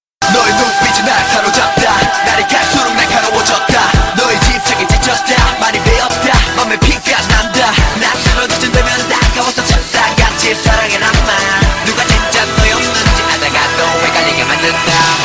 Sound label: Rap